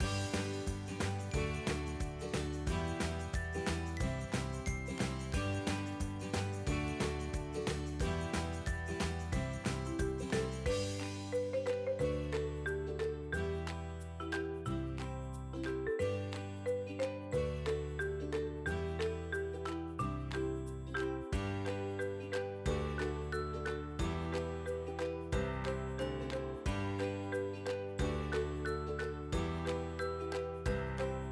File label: music cheerful; bgm